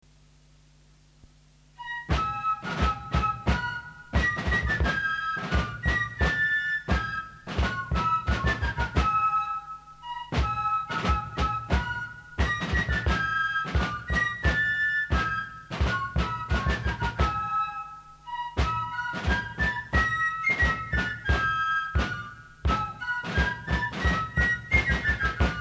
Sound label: Fife and Drum Corps; Lexington Green; Midnight Riders; Wilhelmus 1815